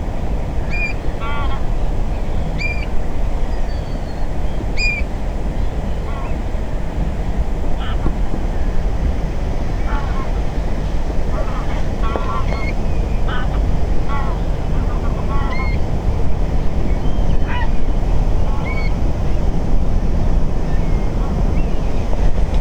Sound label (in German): Goldregenpfeifer